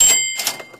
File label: Tip Earned; Food Truck Game